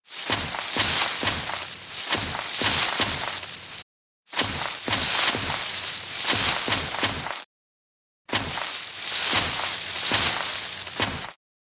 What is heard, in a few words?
thatch